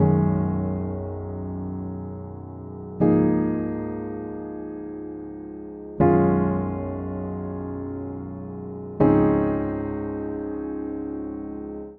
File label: Progression bVII-I Db Major 80 bpm, Upright Piano; MIDI Clips; Hot Water Bottle; Ableton; Factory Packs; Spitfire Audio